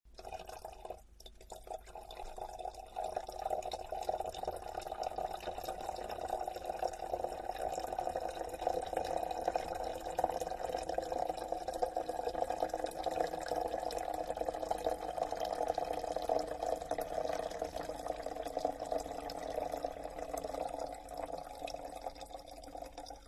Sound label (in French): coule